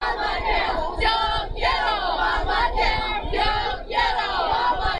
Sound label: Obamacare Chant